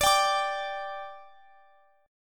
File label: G 5th D, chord